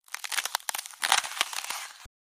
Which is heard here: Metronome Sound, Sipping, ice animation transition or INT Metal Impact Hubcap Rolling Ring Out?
ice animation transition